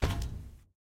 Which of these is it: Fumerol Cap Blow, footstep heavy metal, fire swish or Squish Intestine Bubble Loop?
footstep heavy metal